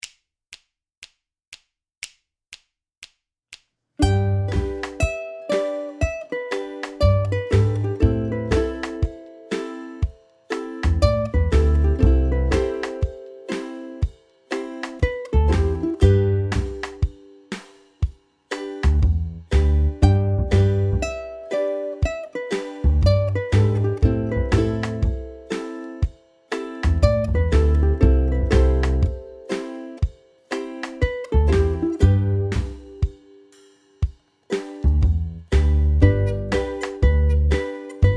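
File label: BACKING, ukulele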